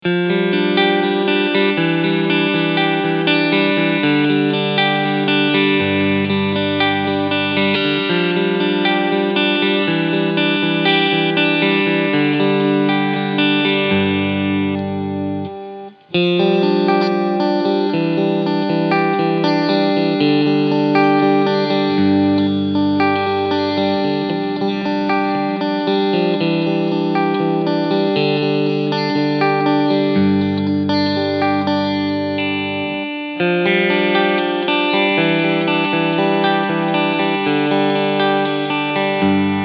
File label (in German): Cort G 280 Select Amber Alle Cleansounds